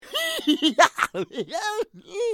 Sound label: Pig laugh; Bad Piggies